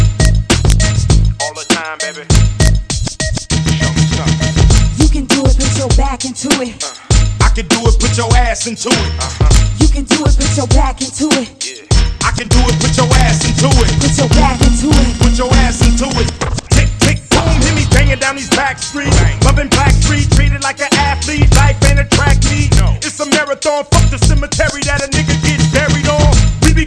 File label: hiphop